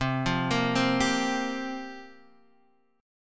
C 7th Suspended 4th, Guitar, Standard; C7sus4 arpeggiated

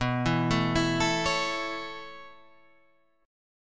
B 9th Suspended 4th B down Guitar Half Step Down; B9sus4

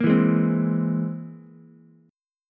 C Augmented 7th, Ukulele; strummed; JGuitar

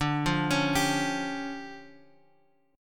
D Minor Major 7th Double Flat 5th D, guitar; DmM7bb5; {X 5 3 X 2 3} arpeggiated

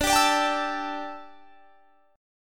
D Suspended 2nd Suspended 4th D down; strummed

